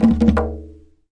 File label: arabic perc, Arabic Percussion Kit